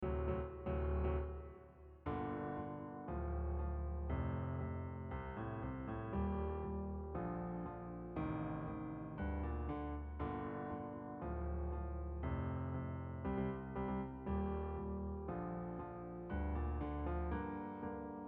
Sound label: Piano